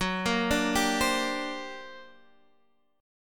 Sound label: G Minor Major 11th, F sharp